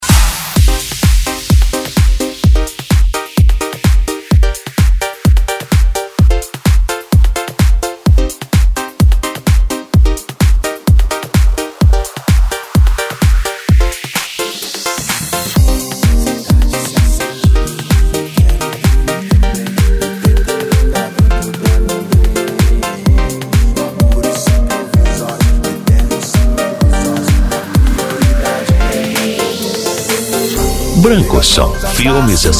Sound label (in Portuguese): dance e funk retro; branco som